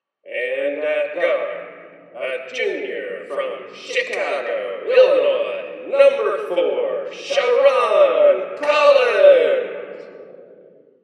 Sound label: Announcer Clap